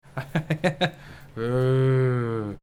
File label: zombie laugh and groan